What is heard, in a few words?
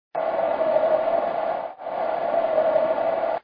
Wind Repeat; Super Mario 63